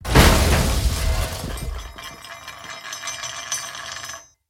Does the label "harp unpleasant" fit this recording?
No